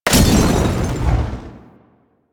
Wolf Gangster Molotov Explosion B; Warframe; Grineer Wolf Gang

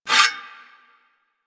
Melee Impact Metal; Warframe; Weapons

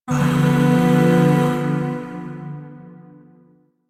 Zariman Void Shawzin Maj Chord

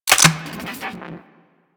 Shock Rifle Mech, Weapons; Warframe; Corpus

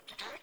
Animal Rescue Trapped Hare Call; Encounters

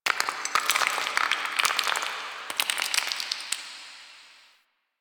Stone Debris; Warframe; Quests; TheNewWar; Ambience; Zariman

